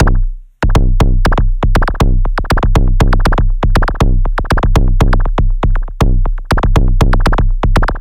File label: Structured Rhythm, IDM Rhythms